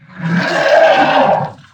sucker breath, bloodsucker

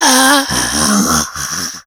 fast zombie die

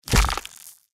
mirelurk foot; npc

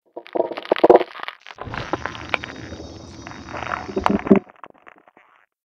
mirelurk idle; magmalurk; npc

